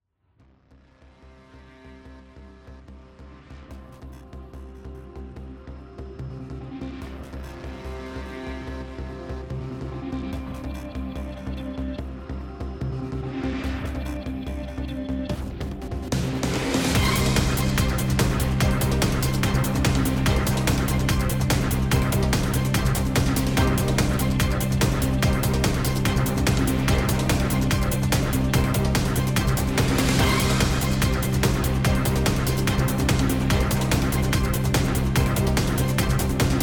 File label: bgm